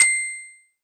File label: correct